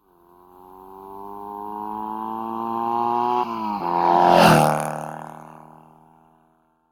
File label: car; Blind Drive